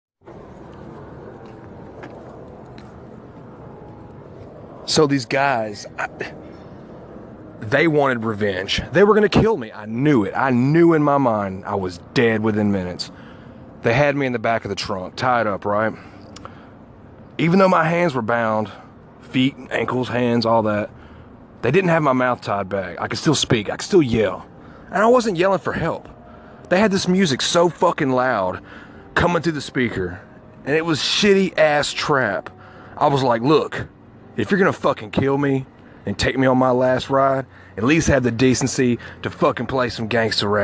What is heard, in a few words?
Trap music death sequence